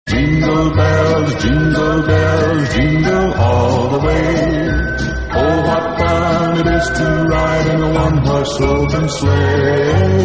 jingle bells classic

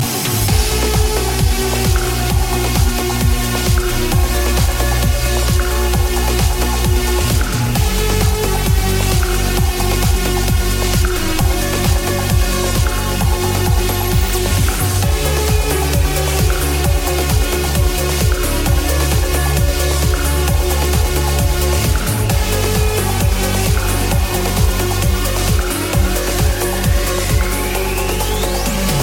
trance

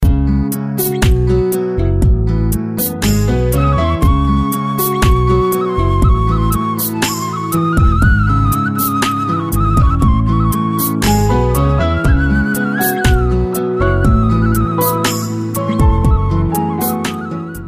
sad music instr

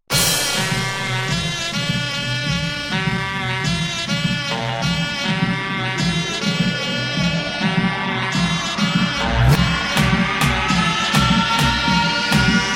samba latin beat

